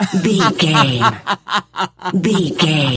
voice male laugh high long

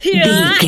voice female attack

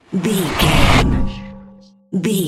fear confuse horror spell

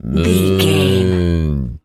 cow moo

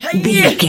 voice female attack

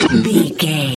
troll monster cough

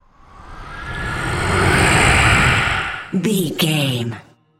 dark wind growls

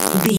fart squirt

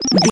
retro computer code signal

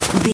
footstep snow walk

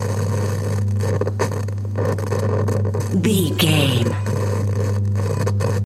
radio tv electronic static hum loop

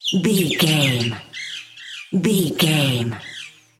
bird small song call chirp

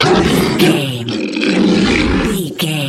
troll monster death

